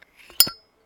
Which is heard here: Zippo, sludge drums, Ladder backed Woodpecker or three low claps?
Zippo